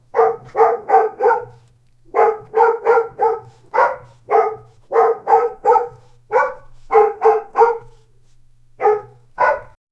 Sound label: a large dog barking three; authoritative